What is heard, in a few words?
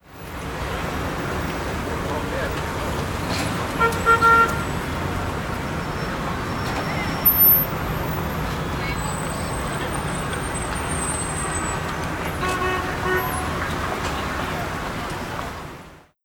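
Miami City ambience; Google Earth VR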